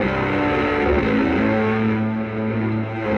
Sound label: Fierce, Electric Guitar; Sonic Foundry (Sony Creative Software); Friendly Fire; Wrath